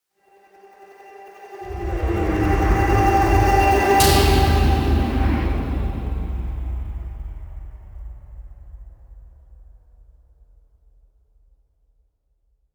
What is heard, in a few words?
Impact